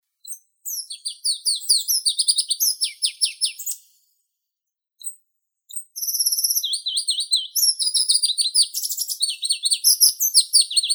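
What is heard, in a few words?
Pallas's leaf warbler Phylloscopus proregulus, nature sounds; penochka korolkovaya; zvuki prirodi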